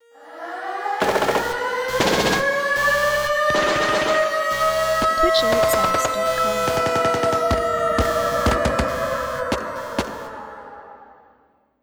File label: Retro Raid